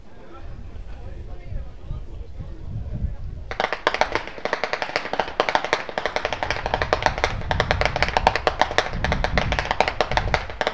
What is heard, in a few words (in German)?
schnalzen, flachgau; anthering